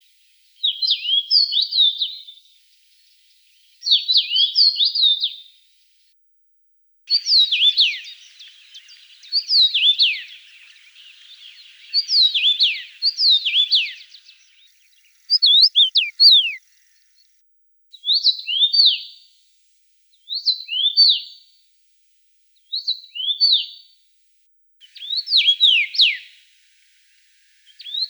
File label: Carpodacus